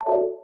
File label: menu click; #-K- Elaina [Less Animation]